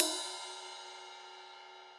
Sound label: ride, Millenium MPS-400